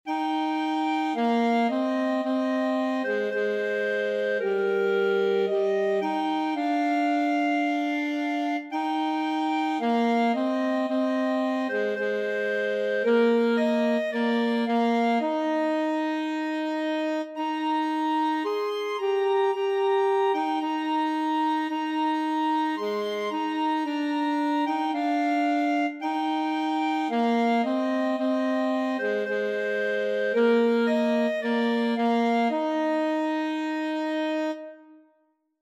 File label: nearer my god brass, clarinet saxophone duet